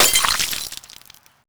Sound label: break bottle full; breakable